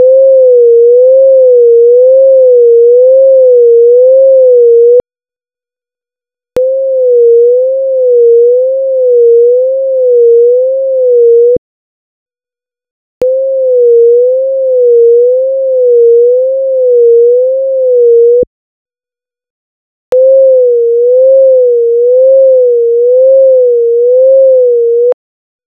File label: Sin 500Hz Mix